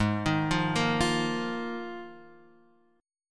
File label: Bb Suspended 4th Ab, chord; Bbsus4/Ab arpeggiated